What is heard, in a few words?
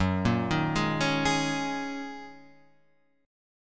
F sharp 6th Add 9th F sharp Guitar Half Step Down